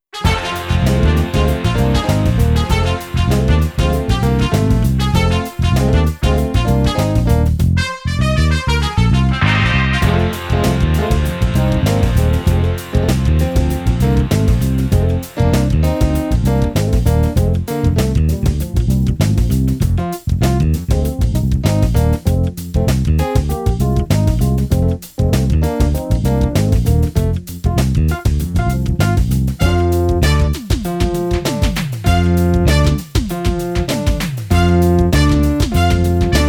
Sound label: Friendship Chant Instrumental